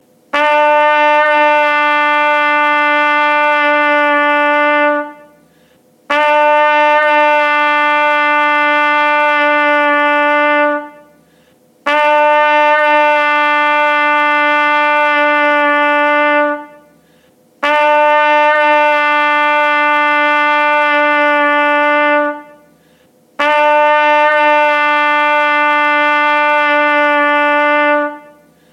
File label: trumpet e